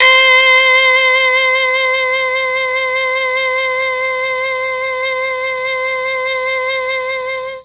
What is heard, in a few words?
C4 sax, mellotron; MKII